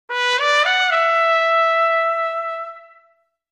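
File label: Music Logo Solo Trumpet; horn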